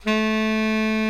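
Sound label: Tenor Sax B; TSX TENR SAX; Giga Samples Collection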